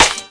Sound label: snare